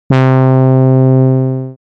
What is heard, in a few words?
Dream Brass; instruments